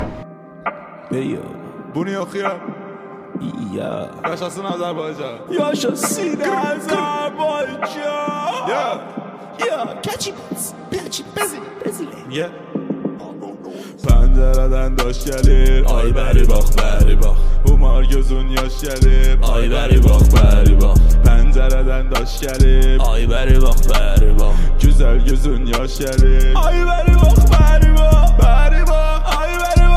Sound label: music rap